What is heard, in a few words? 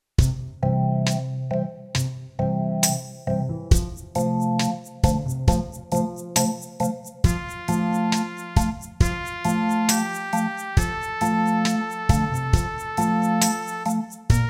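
Pease in F, backing tracks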